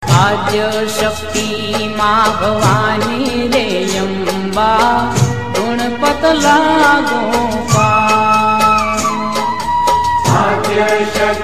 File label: garba maataji stuti